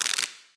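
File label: electricity; smite; kuzenbo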